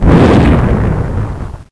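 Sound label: explosion; gusanos promode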